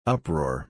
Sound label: uproar